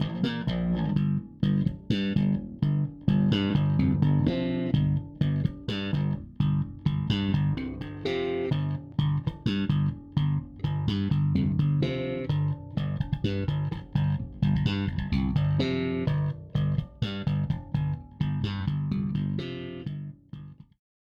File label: Bass DI Amp